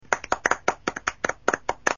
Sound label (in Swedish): Applader